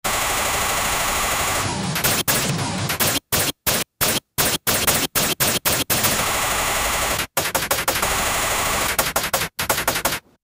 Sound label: laser gun battle in a futuristic setting